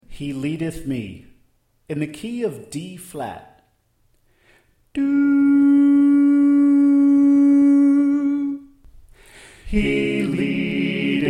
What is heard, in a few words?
Soprano Left